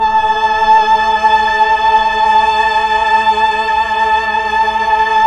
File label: PAD, Slow Str+Vox; Roland LCDP09 Keys of the 60s and 70s 1